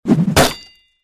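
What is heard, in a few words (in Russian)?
вращение и удар со стеклом или металлом; Звук ножа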